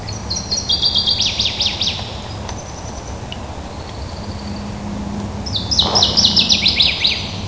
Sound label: waterthrush, birds